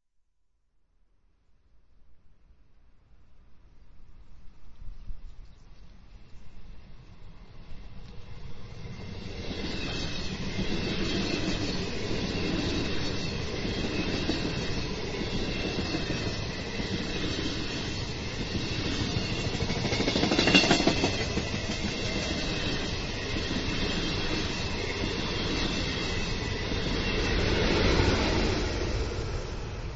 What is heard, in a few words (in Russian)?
3D Poezd; 3D звук , слушать только в наушниках (иначе эффекта ни будет ) , желательно в компьютерных